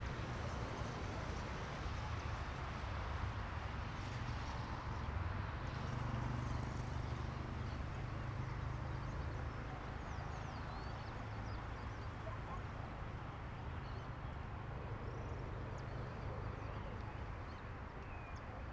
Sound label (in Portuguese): Rodovia longe com moto no inicio, passaros e cachorro latindo; transito; Coleção Sonora do Cerrado